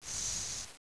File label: Hell Spider Attack